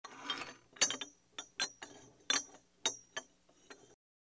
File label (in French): Stem 1, version « Source », avant traitements